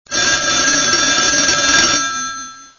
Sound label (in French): sonnerie telephone